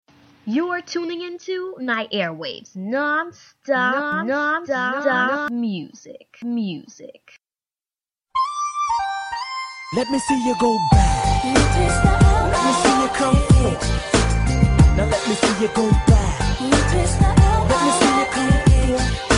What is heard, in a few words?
nonstop 90s music hip hop soul; nyairwaves; facesofsuccessradio101fm